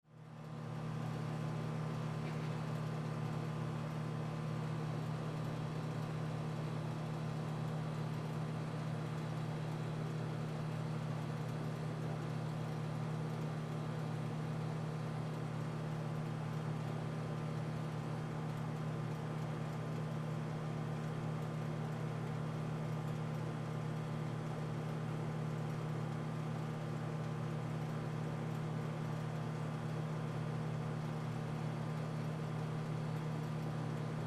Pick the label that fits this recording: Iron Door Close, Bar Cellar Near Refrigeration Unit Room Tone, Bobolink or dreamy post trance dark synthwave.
Bar Cellar Near Refrigeration Unit Room Tone